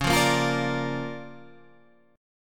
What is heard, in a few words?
D Minor Major 7th Sharp 5th, C sharp, guitar